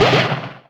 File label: item box